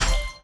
Cube Put

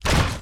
Robot idle

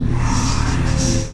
Soul Scream effect

